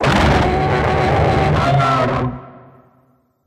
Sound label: Glyphid Oppressor Scream